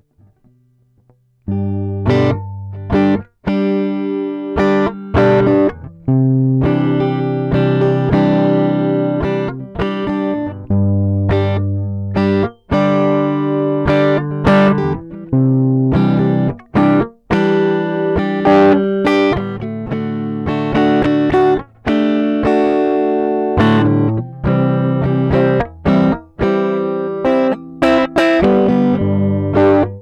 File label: Warm Audio WAMPEX Instrument